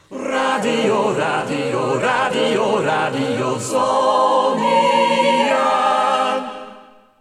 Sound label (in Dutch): stationcall koor a capella; jingles radio zoniën 1982-83